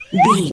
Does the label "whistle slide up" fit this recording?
Yes